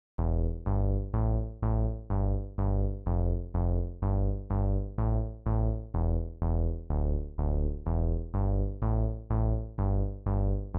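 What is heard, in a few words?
Voyager low end broke low bass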